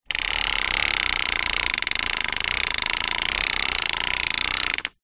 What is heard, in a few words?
leisure toy car push wheels click